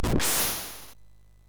sid MOS 6581R2

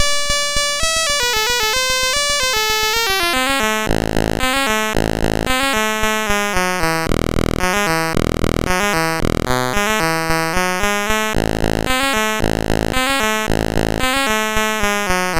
Mutant Monty, MOS6581R2; sid

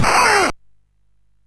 sid MOS 6581R2

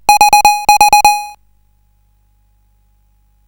Mini Arcade Climax, CSG8580R5; sid

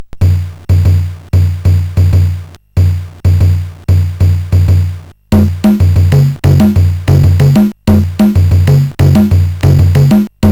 Rock tune, CSG8580; sid

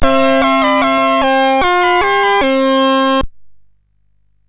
sid MOS6581R2; Fiendish Freddys Big Top O Fun

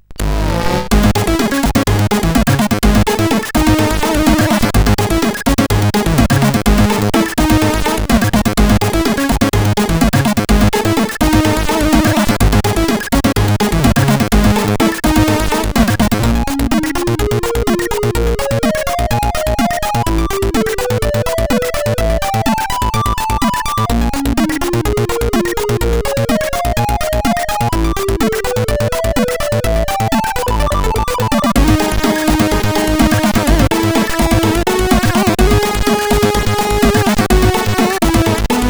sid CSG8580R5; Helicopter Attack

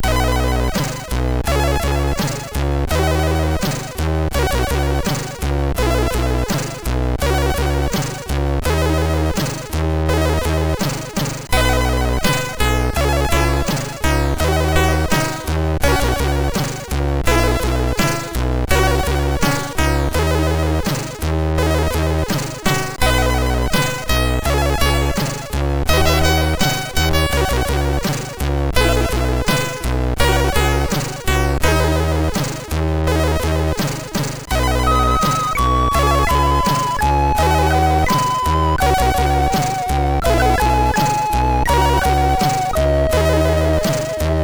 Snowball, MOS6581R3; sid

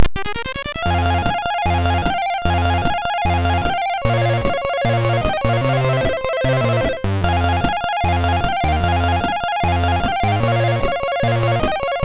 sid MOS6581R3; Gremlins 2-The New Batch

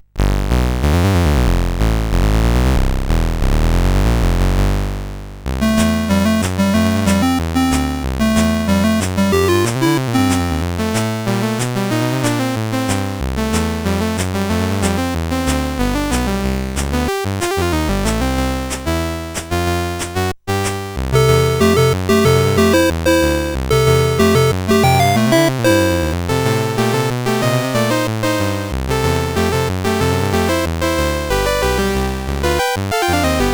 sid CSG 8580R5; GeeBee Air Rally

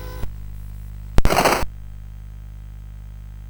sid CSG 8580R5

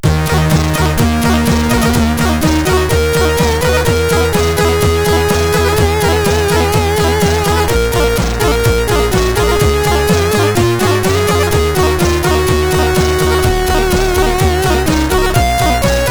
sid CSG8580R5

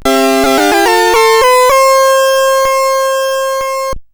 sid CSG8580R5; Fiendish Freddys Big Top O Fun

